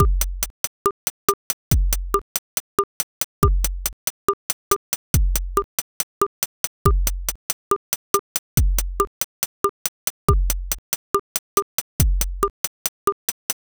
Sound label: bossa nova pattern